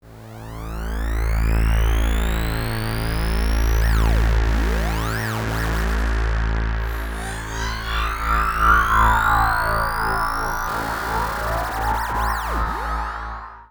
SPACE PAD 140 A; SpaceSounds

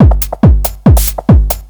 hard beats 140, hard trance beats

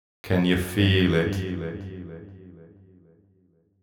deep house male, 125, A, vocals

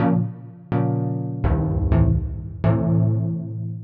DEEPHOUSE ORGAN 125 A

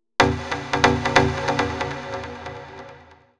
TECHNO EXTRA 140

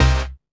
dance chord

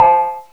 COW BELL; KillerTweaksII; DirectWave

